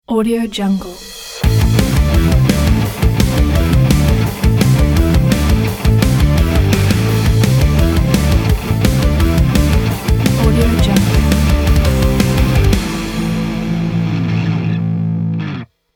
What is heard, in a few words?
powerful rock logo